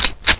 de clip in, weapons

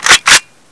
m4a1 bolt pull, weapons

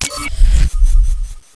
cloak switch

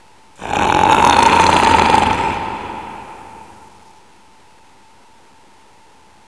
amb; cstrike; DEX

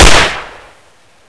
elite fire, weapons

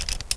m249 chain, weapons

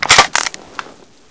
awp bolt forward, weapons; cstrike; DEX

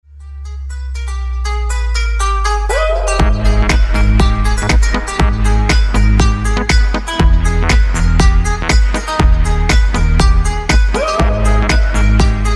endround; cstrike; DEX

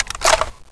m249 box out; cstrike; DEX

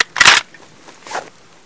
m4a1 clip out; cstrike; DEX

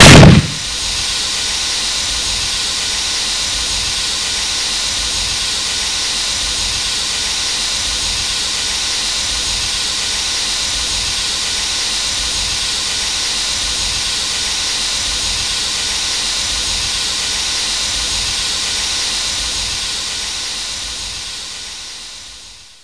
explode

